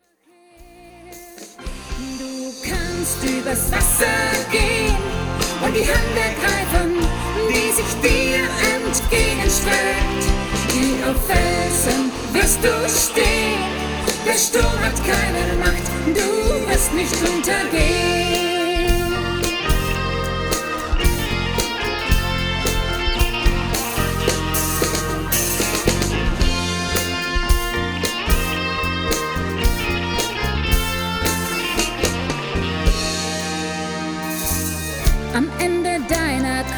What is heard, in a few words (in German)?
Du kannst übers Wasser gehn